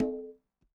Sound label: conga, latin